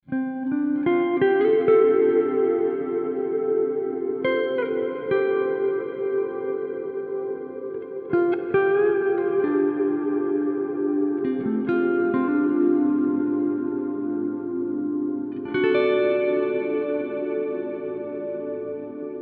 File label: Guitar Cathedral Full Bright M100